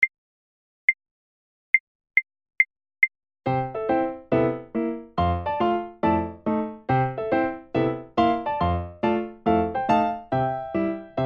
Foxtrot; Seiber

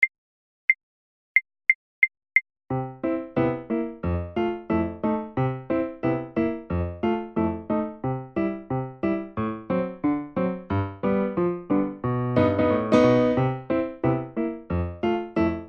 Foxtrot 90 Secondo; Seiber

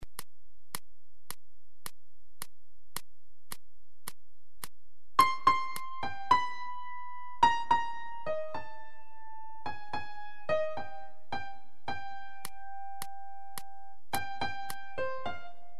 Tango Habanera 108; Uebungs-CDs; Playbacks; Seiber

